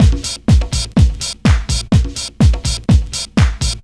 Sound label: loop 125bpm, House Drum Loops